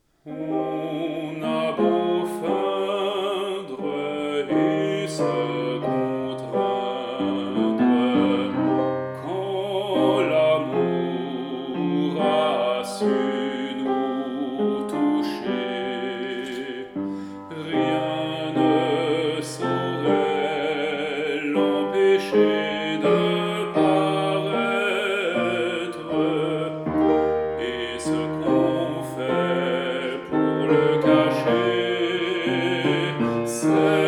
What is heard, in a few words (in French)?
Alto; Lambert; On a beau feindre